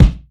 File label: tight low end kick drum sample D key